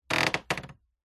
household door wooden creak hinge short

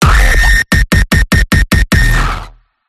distorted glitch lfe impact; PMSFX

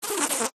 animals insects bee buzz against window

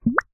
cartoon slime drip; zapsplat

